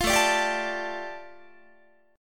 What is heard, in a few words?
A 7th Suspended 4th Sharp 5th A, Guitar